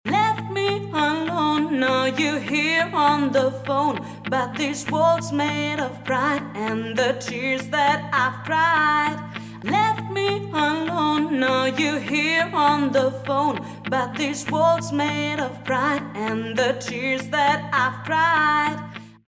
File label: Vocal